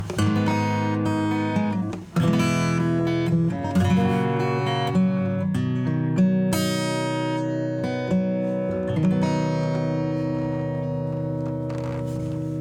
Acoustic guitar

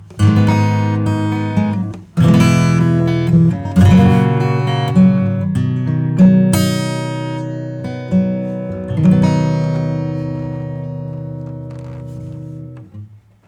Guitar Acoustic; Nanopulse

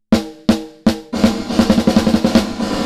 Plate pre delay 1.00 s; UVI

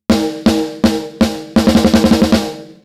snare preset Raw Snare; AMEK 9099; TG Shape

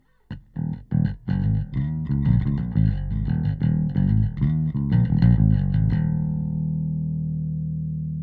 bass Bass DI; SplitEQ; DI-stinction

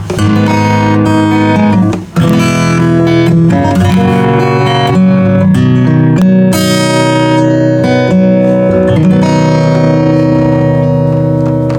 Heavy Guitar Parallel; Royal Compressor

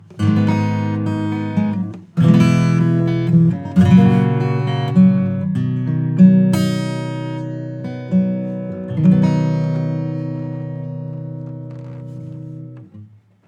acoustic Guitar Dark Acoustic Guitar; MASSIVE

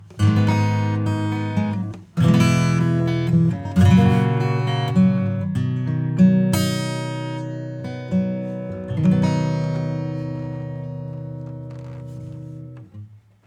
acoustic; MASSIVE

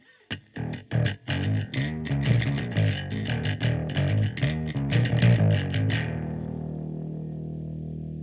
bass Metal Tip; Valves

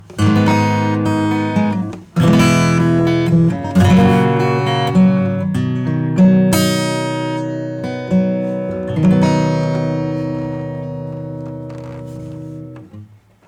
acoustic; FireMaximizer

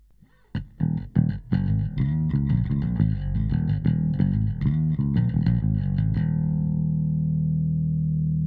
Bass harmonics out

